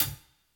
drumbreak hihat dry; Hiphop

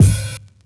drumbreak kick; Hiphop

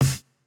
Jrock snare

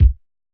japanese pop kick

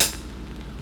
drumbreak hihat; Hiphop

